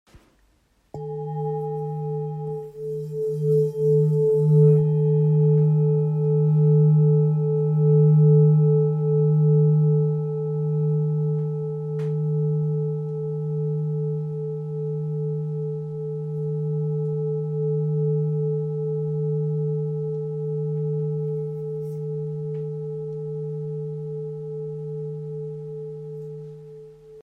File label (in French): Bol therapeute Sol diese